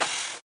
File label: Impulse